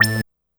Synth Hit A

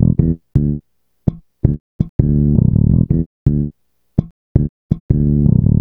bass (snappin); Sonic Foundry (Sony Creative Software); Ugly Remnants VOL-2; Knutrix Demo

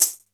BELL, D110 DRUMS; Crypton - DrumBase5000

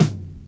SD, Dance Mega Drums; Best Service; SD-HIP

